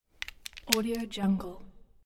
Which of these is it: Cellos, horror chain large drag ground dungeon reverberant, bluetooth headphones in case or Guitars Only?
bluetooth headphones in case